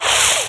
weapon whiz by, zings; Spearhead Demo; MacFormat 2003 June

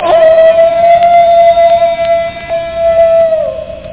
jaul; Aminet 21; GTI - Schatztruhe; Amiga 8-bit Sampled Voice; 8,363 sample rate

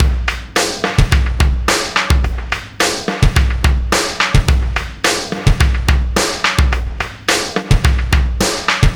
Oscillation Beat; Processed Drumkits: Zero-Gravity Beats; Sonic Foundry

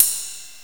Ride Cymbal; GMPlay; Drums; TG300B

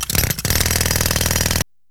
jackhammer; wob2 demo

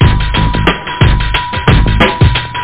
power drums

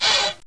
Arrow Buttons; Education Publishing Concepts

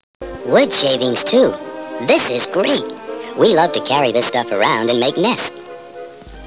gerbile; Hobby Corner: Nature Hobbies

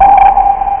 new special; SpaceInvader! 1.04

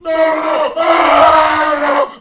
ON FIRE

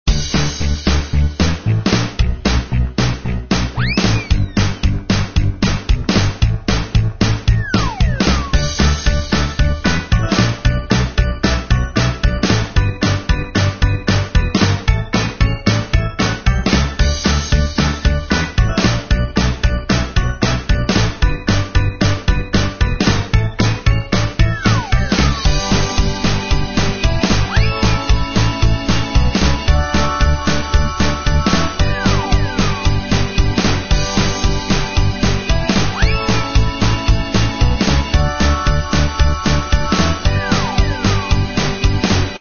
game Music; mecha cat destructo

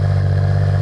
Car X Idle; urbanchaos

